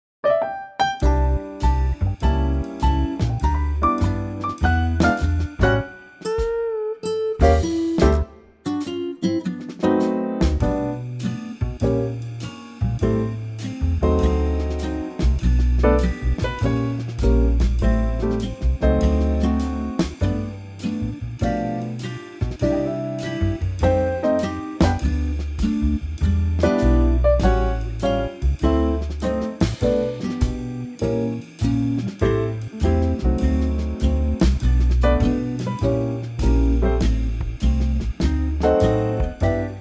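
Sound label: G, Backing Tracks for Male Vocals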